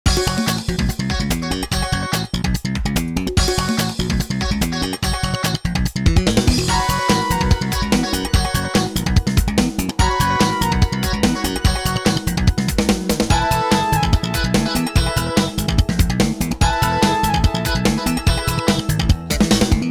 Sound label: Music Menu; Let's Golf